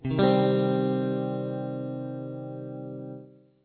C minor open, guitar

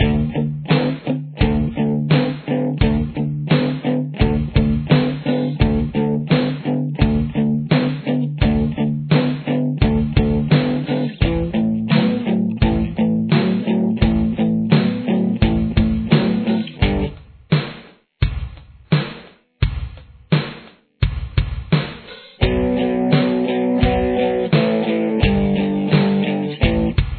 verse guitar

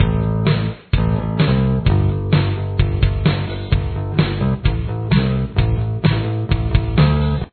bass

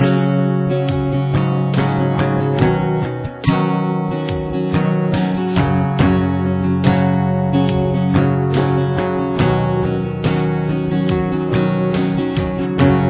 chorus, guitar

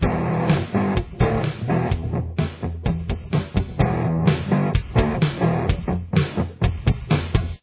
electric

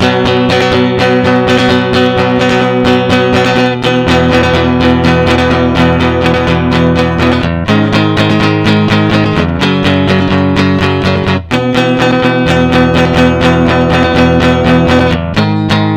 chorus, guitar

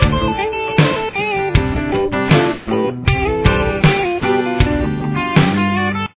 run

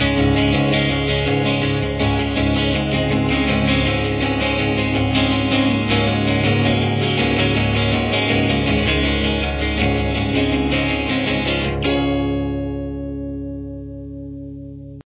outro

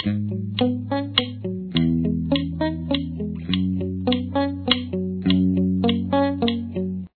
slow, guitar

